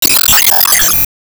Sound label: Enemy Pirate Fall